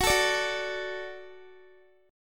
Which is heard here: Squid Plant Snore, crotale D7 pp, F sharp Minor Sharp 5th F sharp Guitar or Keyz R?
F sharp Minor Sharp 5th F sharp Guitar